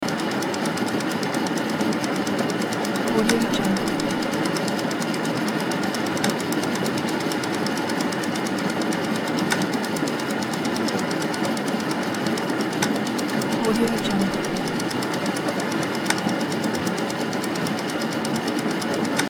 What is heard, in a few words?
steam cooker